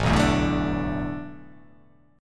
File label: A Suspended 2nd Suspended 4th; Asus2sus4 strummed